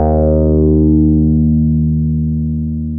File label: D#2, Bass; E-MU Producer Series Vol. 6 – Analogia Project; Boom da Bass